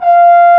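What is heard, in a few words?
French Horn mf F4; Digital Sound Factory Virtuoso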